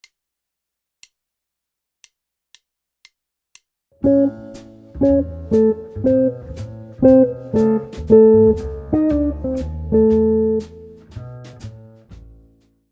licks, jazz guitar

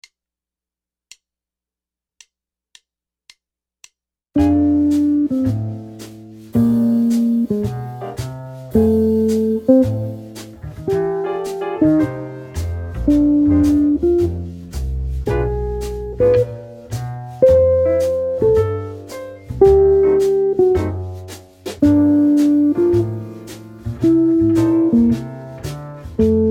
Scale Rhythms, guitar

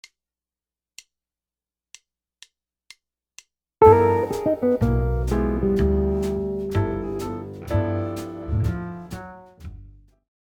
altered scales, guitar

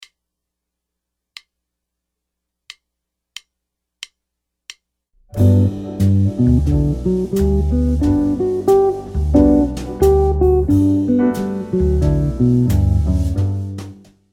arpeggios, guitar

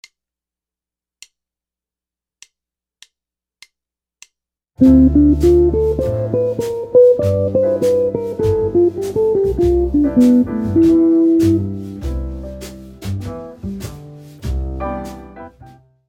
bebop, jazz guitar

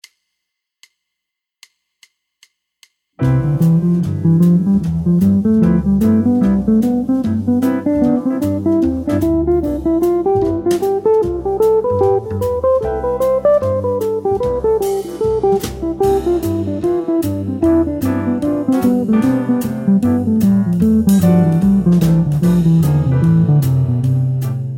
jazz guitar scales

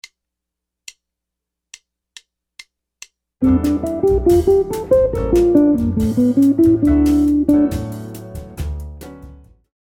brazilian jazz licks, guitar

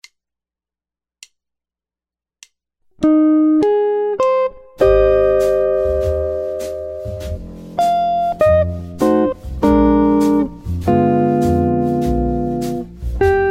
chord melody, guitar

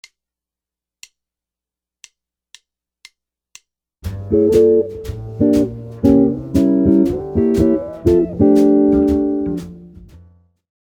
Chord Licks, jazz guitar

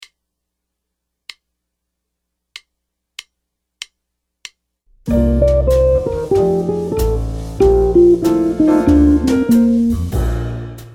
ii V I licks, jazz guitar

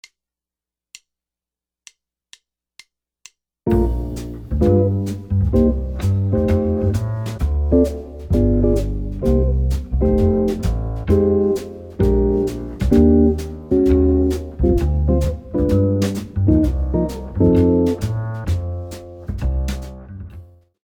rhythm changes, jazz guitar

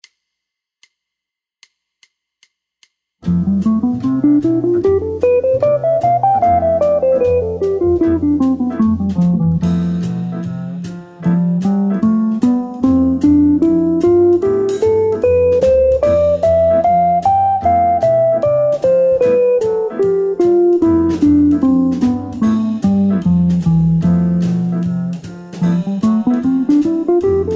lydian guitar mode

